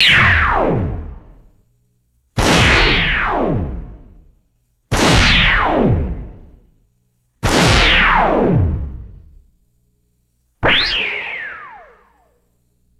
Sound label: retro sci-fi laser weapon bursts; lasers